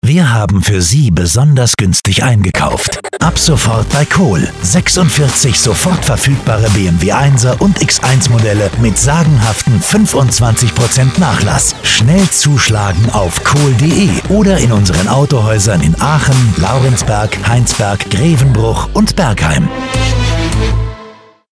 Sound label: Soundlogo BMW; BMW 1er Spot; Radiokampagnen